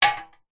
industrial sack truck trolley metal stand upright gently concrete